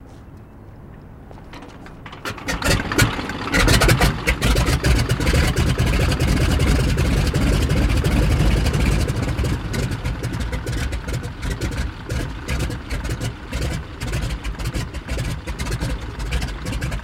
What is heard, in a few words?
Bi Plane Strt Sputter, land & takeoff; Biplane Start And Sputter